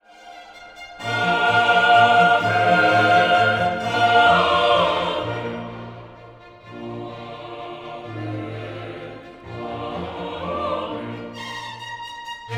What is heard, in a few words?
Gloria Violins oblivious